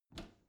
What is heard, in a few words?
bathroom cabinet open regular; objekte; tueren